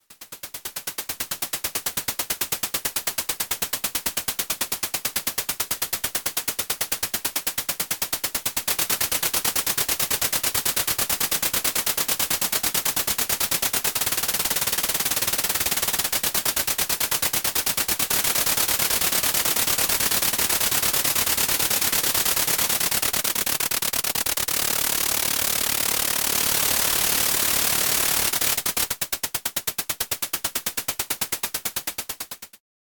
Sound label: Pittsburgh Modular Lifeforms Percussion Sequencer Density